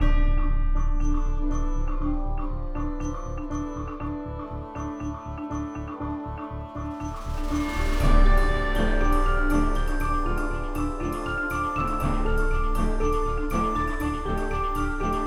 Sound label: suspense